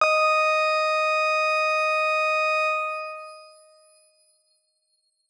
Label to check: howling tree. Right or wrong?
Wrong